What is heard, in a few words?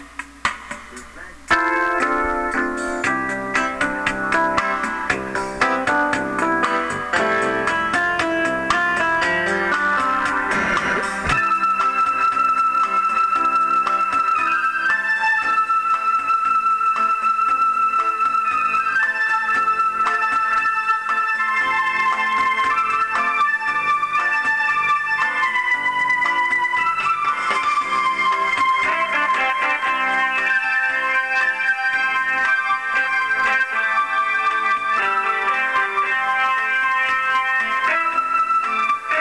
powerpop